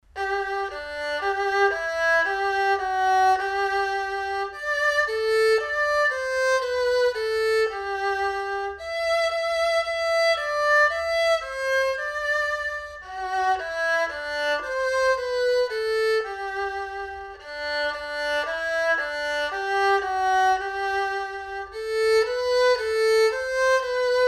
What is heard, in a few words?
erhu